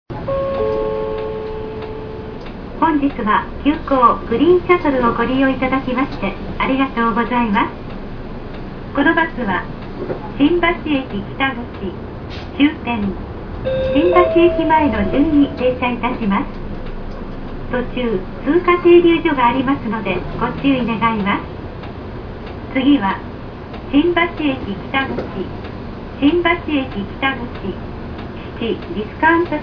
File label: rapid bus